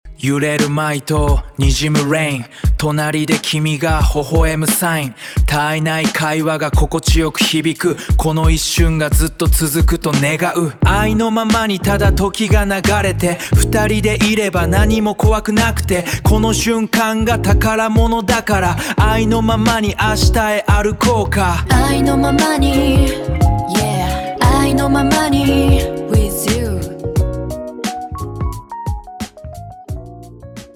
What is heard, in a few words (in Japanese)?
男性ボーカルの日本語ラップ; Lyria 3; Gemini